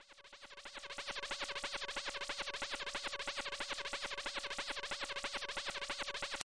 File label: techno ufo; Various Strange Sounds and Effects